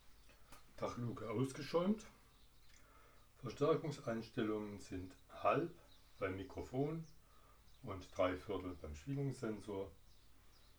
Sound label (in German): Dach Luke mit Griffe, Unimog